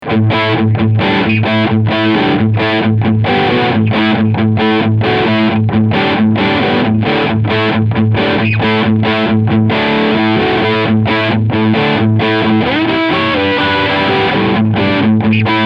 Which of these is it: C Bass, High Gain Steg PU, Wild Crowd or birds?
High Gain Steg PU